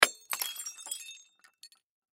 Crash Glass Wine Bottle Throw Break Cement Surface; Breaking; Sound Effects:Objects; Blastwave